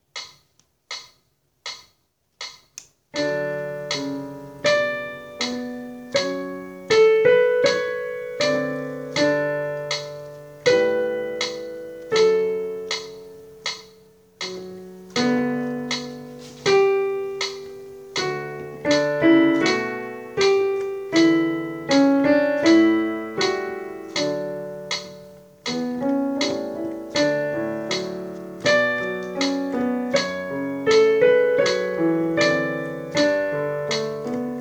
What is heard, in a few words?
Soprano, Accompaniments